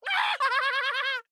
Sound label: Laugh; JunkRat